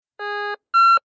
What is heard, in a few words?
Metal detector start beeps; foley